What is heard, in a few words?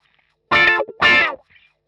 125 wah D, wahwah; PowerFX - Nu Jazz House